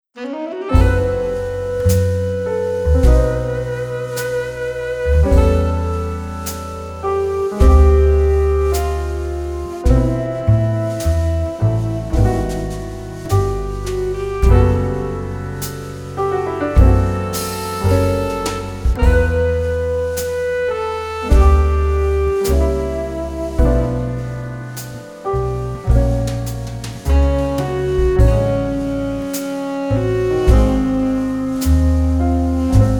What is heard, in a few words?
Saxy